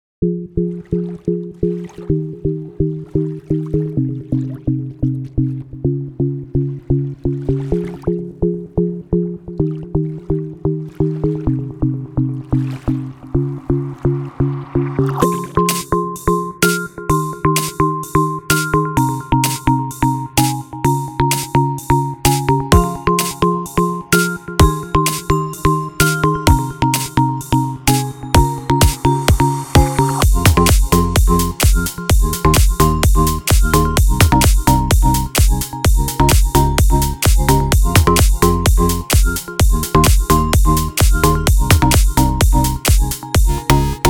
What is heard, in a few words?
Underwater Rave